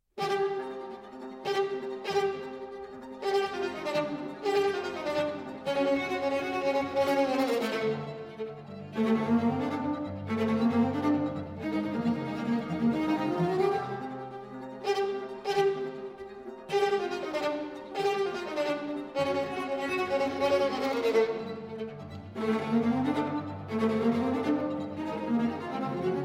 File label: Quartet; Britten